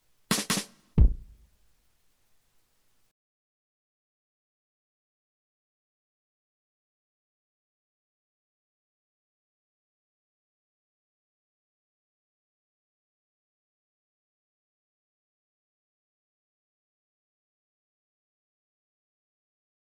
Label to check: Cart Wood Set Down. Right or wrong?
Wrong